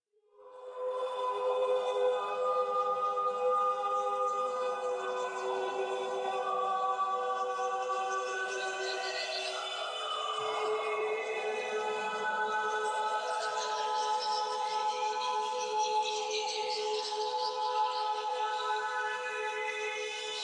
Choir; Warframe; DE; Sentients

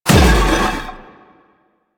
Shield Scrape; Warframe; Weapons; Melee; Whooshes

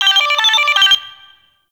Friendly Hack Jingle; Warframe; Entrati; Necramech Hack

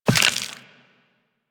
Walk Bone, Footsteps; Warframe; Operator

